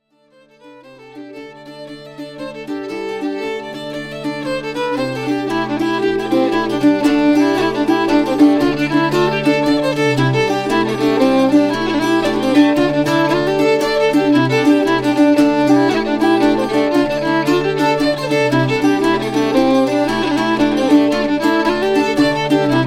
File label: Monaghan Jig